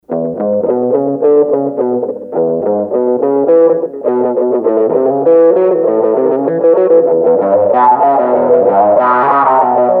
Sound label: Dolls w. Pins, Sony HR-GP5